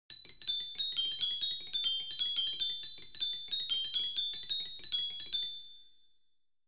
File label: magic chime